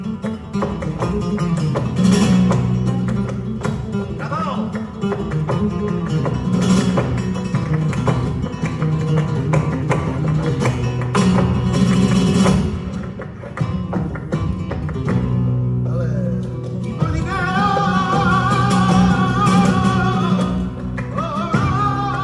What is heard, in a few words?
letras solea x buleria, flamenco; letras 1 et 2 solea x buleria villette